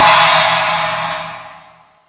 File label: More Cymbals, Drum Machines